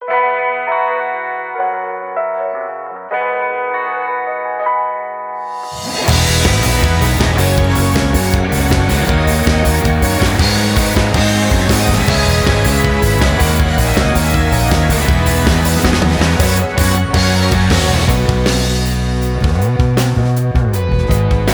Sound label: off vocal; Clochette; OP Song Collection